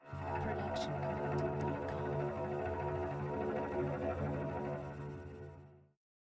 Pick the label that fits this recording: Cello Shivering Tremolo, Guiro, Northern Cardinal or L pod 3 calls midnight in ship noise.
Cello Shivering Tremolo